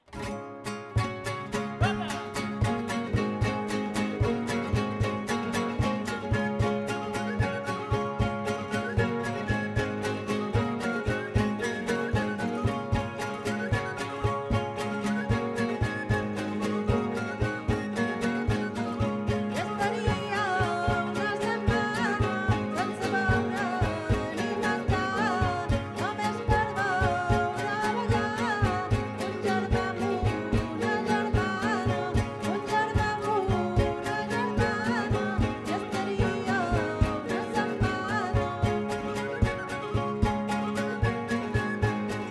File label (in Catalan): Jota per dalt amb flabiol